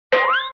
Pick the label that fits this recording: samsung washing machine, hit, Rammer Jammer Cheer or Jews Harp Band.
hit